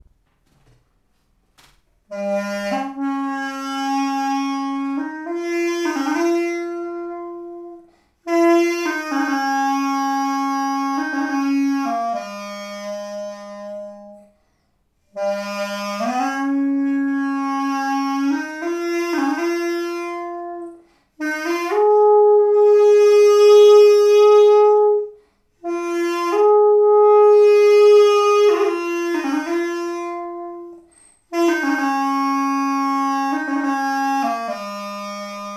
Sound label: bamboo sax